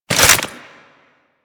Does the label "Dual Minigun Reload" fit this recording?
Yes